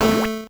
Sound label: level squish A